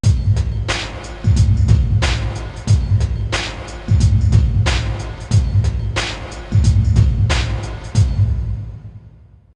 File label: Ditty; NBA Live 99; PlayStation